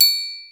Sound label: TRIANGLE OP, PERCUSSION; Crypton - DrumBase5000